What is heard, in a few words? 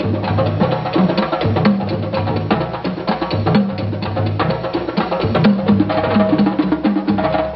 african lofi, drum loops and breaks